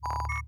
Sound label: button hover